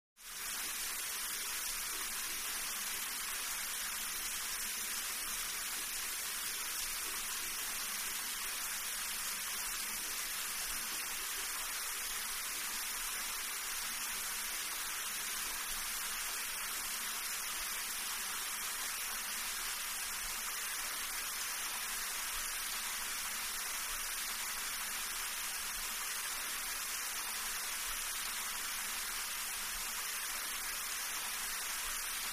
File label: Shower Heavy Light Sp; Shower - Heavy & Light Spray / Some Gurgles